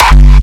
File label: Kick, Raw-Hardstyle; raw hardstyle kick pack 1